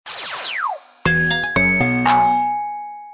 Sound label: notification